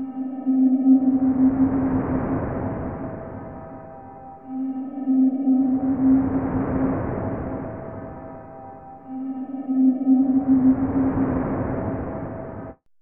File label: MYSTERY, AMBIENT; AMG - Vince Clarke Lucky Bastard